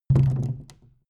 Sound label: canister empty put down